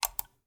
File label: click; Ben 10 - Alien Catcher